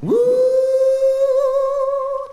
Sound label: VOC